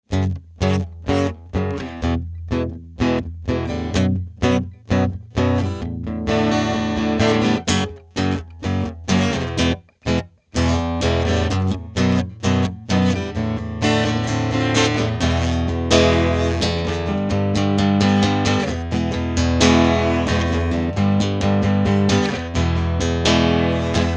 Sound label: Retro20 clean